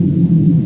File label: silo loop